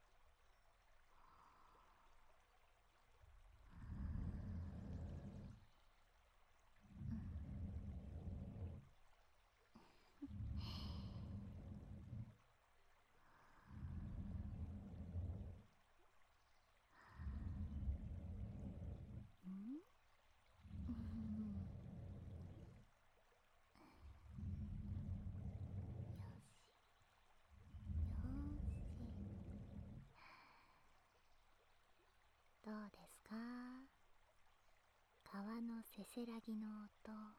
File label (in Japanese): ささやき、ヒーリング環境音・小川のせせらぎ, ASMR; 看板娘の夢、小川のせせらぎにのせて